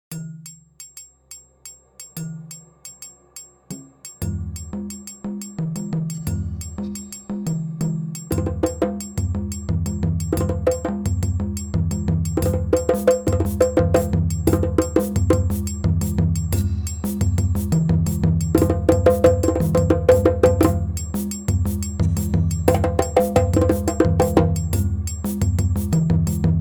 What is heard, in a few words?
Mask Dance Solo